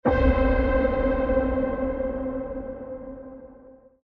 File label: Epic Hit; افکت صدای ضربه سینمایی